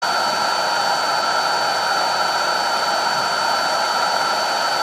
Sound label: opus bt c3100 fan noise sound